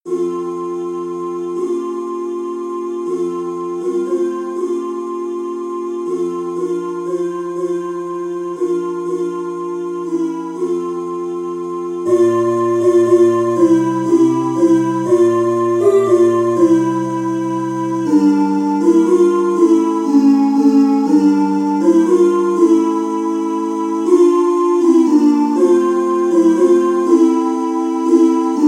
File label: Alto